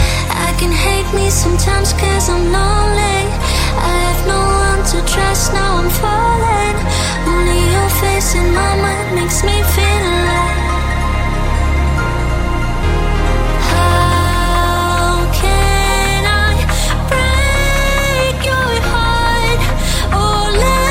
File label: dubstep futurebass euphoric hardstyle edm